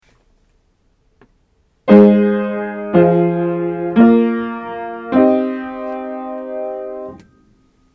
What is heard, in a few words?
Part Writing Root Position Triads Aural Training exercise